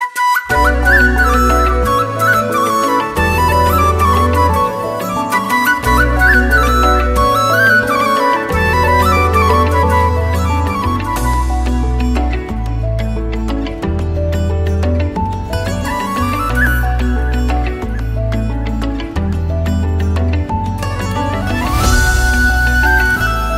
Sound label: FLUTE RINGTONE